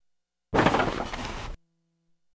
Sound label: Bed Squeak Smaller; bed movement